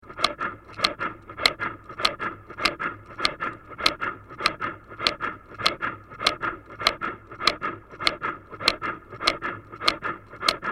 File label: Heart Simulator; On, Run Steady In / Out Breathing With Light Bellow Inflate / Deflate; heartbeats